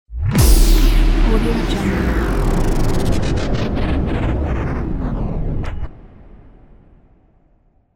shutdown

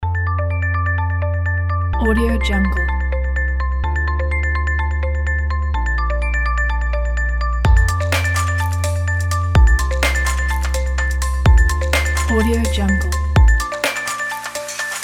motivated tech corporate

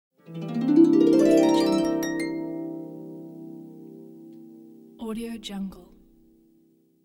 harp gliss

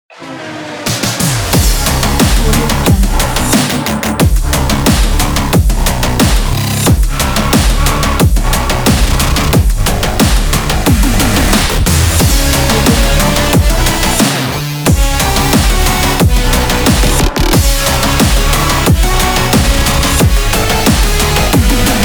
cyberpunk industrial action logo

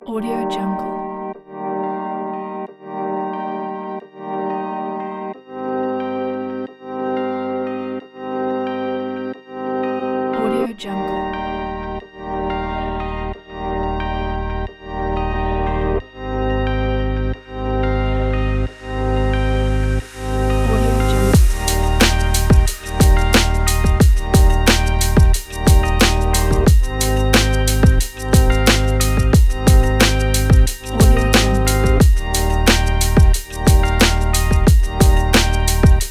urban beat; soundtouch

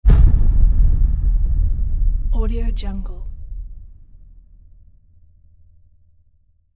impact

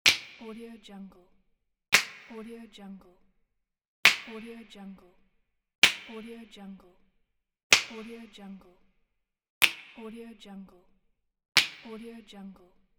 finger snap

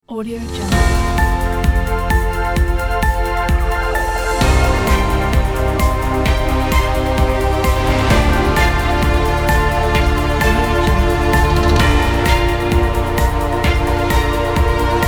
news logo

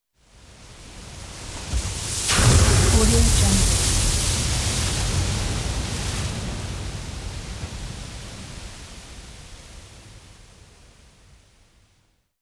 wave spraying; prosoundfx

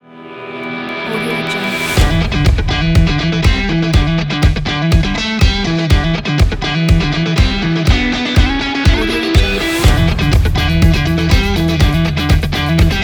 rock trailer

